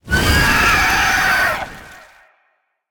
phoenix screech; smite; npc

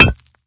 shield clap; Smite; Cabrakan

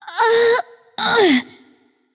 grunt lift light; smite; voicepack; hel

